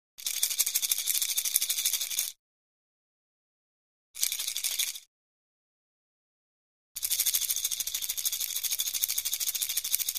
Rattles; Various Plastic Baby Rattle Shakes

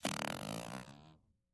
Close Up Fart

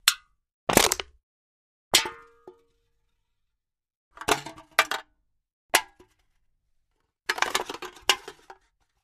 Can Aluminium Crush

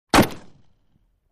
Ak47 Automatic Rifle

